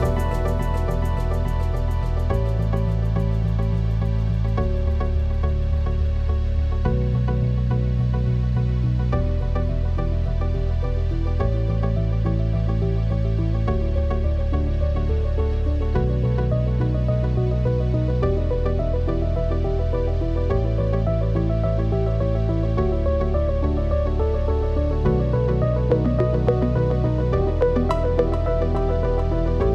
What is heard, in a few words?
synthesis loop; fsm team parallel